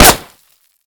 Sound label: Projectile Sounds; Red Orchestra Ostfront 41-45